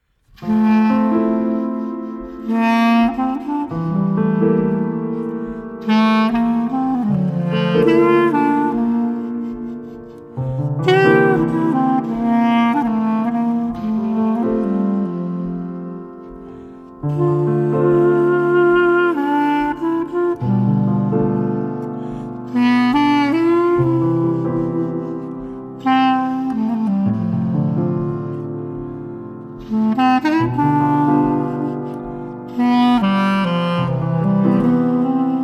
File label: Sinfonia in F major; Johann Sebastian Bach